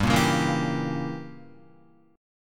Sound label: G Diminished 7th chord, down, Guitar; strummed; JGuitar